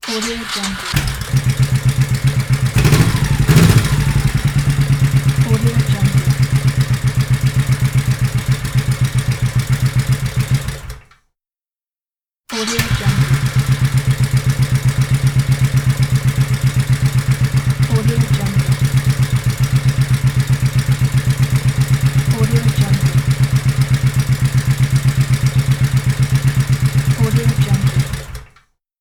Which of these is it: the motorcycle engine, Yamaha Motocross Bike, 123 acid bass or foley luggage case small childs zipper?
the motorcycle engine